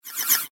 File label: Laser Lasso, science fiction sound effect; Laser Sound Sound Effects; star wars / trek style games; Andrew Fleming